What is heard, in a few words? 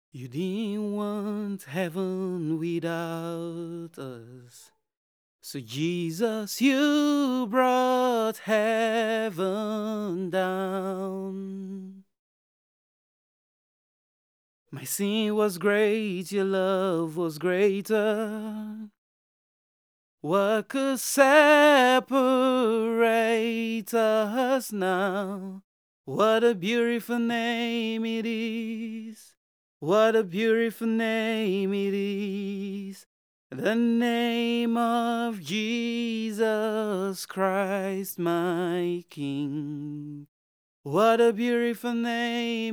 Vocal